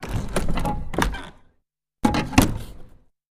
Carriage Door, carriages

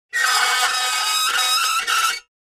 Metal Scrape On Metal

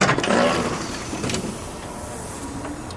Hospital Door Open, pneumatic

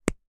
ink pen cap; ink pens

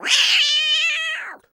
Cat Angry Meow

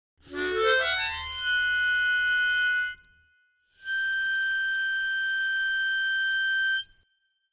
Mouth Organ, harmonica; woodwinds

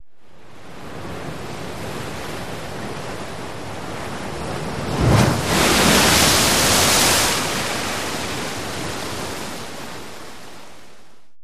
Wave Big Crash On Rock, waves & surf

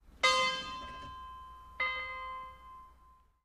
Small New England, bell towers

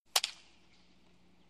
Baseball Bat Wood Di; baseball fx & games

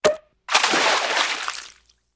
Hollow Wooden Bonk W, water

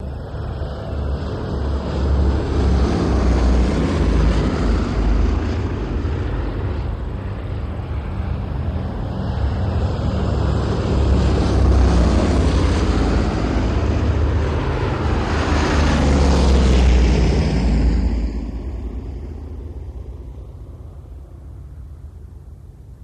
B17 Fly Bys X3 Medium, b 17 flying fortress

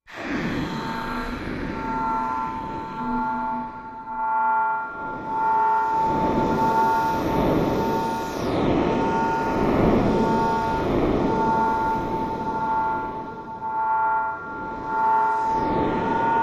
Ocean Sweeper Machine, sci fi ambience

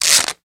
Cloth Rip Single

